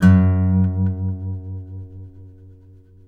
F#, guitars; Spectrasonics; Hans Zimmer Guitars VOL-2; FNGSTYLE PFM

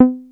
303 A3, TB 303, Synthesizer; Hollywood Edge; Giorgio Moroder Rare Synthesizer Collection

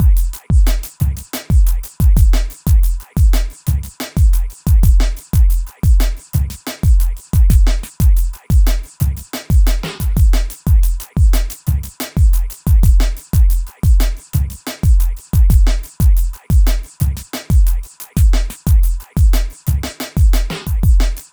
Beat, Hip-Hop

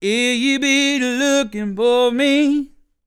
DELTA, BLUES; Blues & Soul; ILIO; Vocal Planet VOL-2

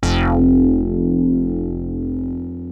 OSCAR F2, Rare Synthesizer; Hollywood Edge - Giorgio Moroder Rare Synthesizer Collection

